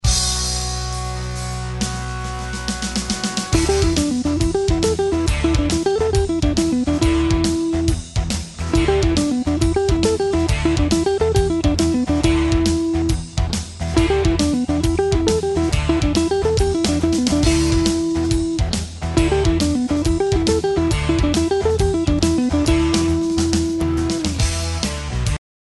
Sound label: Asc Desc Mix Triplets; A First; Penta Blues Patterns